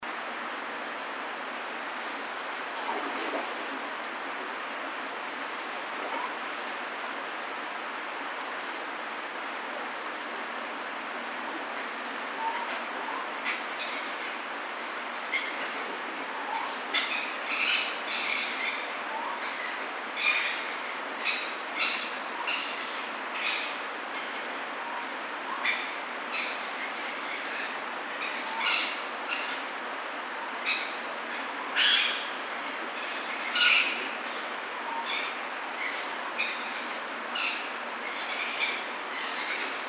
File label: Raccoon; Northern Raccoon (Procyon lotor)